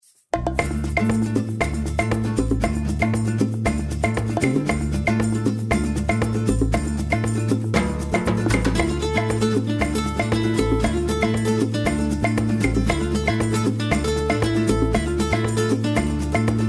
Amazing Chill Guitar; CHILL OUT SUAVE

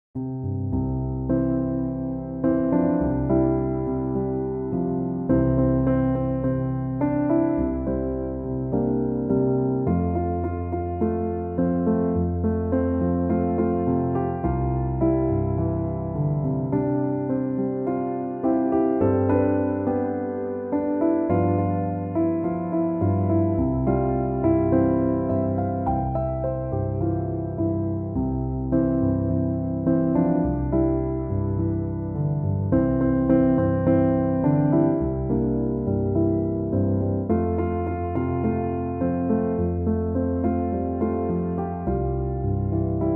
chill piano instrumental; from “The Little Mermaid”

